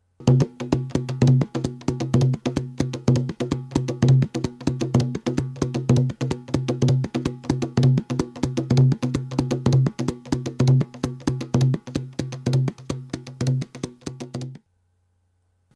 中音太鼓パターン; africa; 太鼓合奏曲; チヤンダ

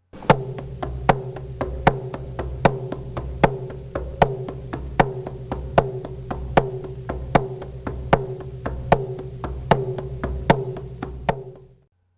ングンダ・パターン（ニャウの太鼓合奏）; africa

